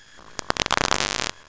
fart

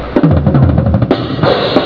open

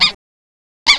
hamster; sirio

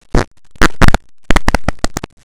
fart

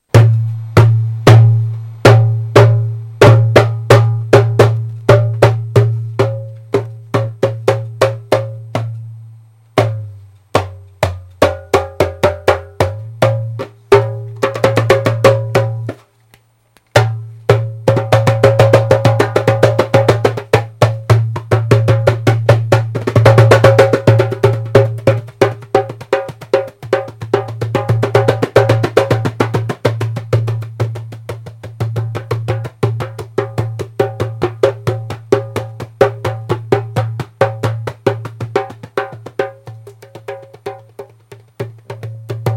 Djembe; Bali Red Toca Djembe

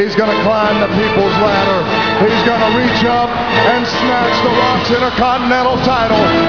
rock

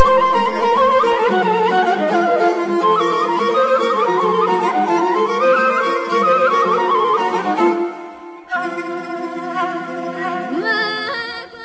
Magla Pad

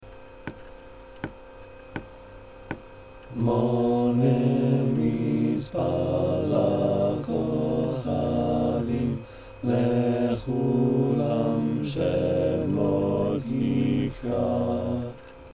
harmony

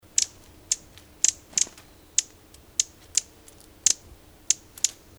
Yellowish bellied Bush Warbler, birdcall

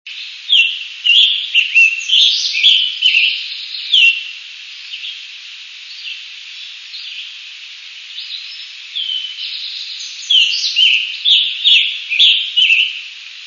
tanager scarlet; birdsongs

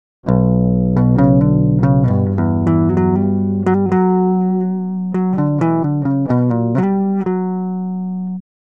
neck, guitar

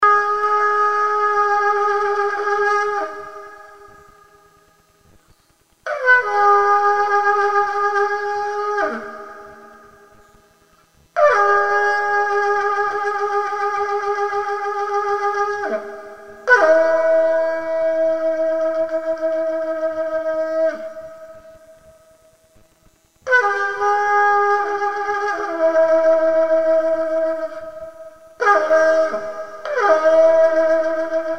trutruka, ethnic instruments

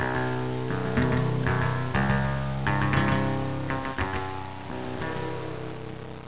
guitar

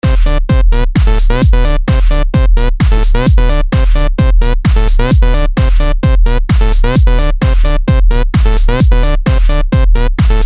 music

